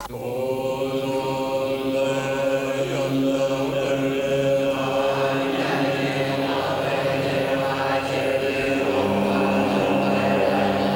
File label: tibet